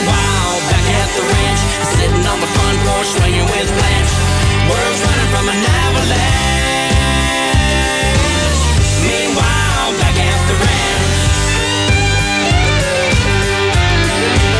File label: country